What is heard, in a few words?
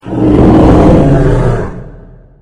pain; slender; guardian